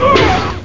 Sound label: Get hit